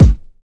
studio steel kick drum sound g sharp key, Royality free bass drum sound tuned to the G# note